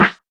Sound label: thick snare drum g key, acoustic snare sample tuned to the G note